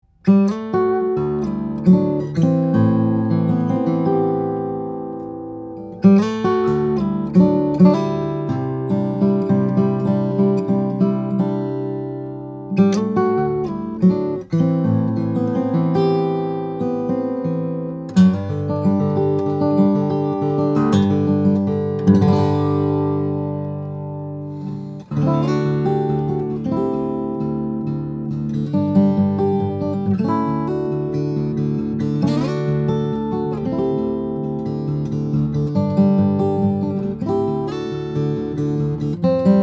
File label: guitars; Olson SJ deluxe; brazilian rosewood; cedar